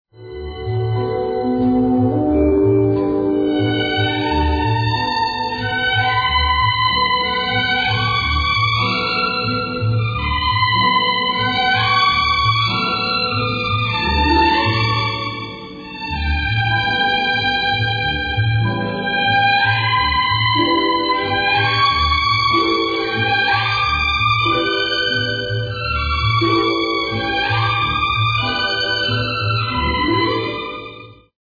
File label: Waltz; Me and the Colonel; Sadness Castle